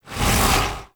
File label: Slash Dash; Warframe; Excalibur Umbra